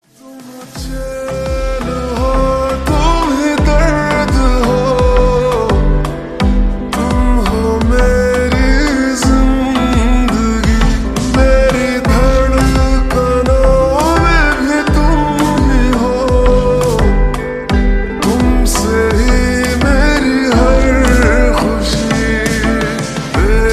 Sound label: Slowed Reverb Ringtone